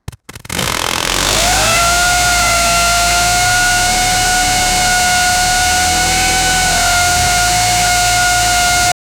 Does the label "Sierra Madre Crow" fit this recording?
No